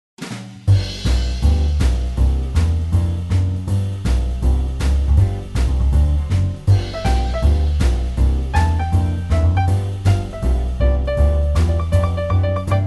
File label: blues; Jazz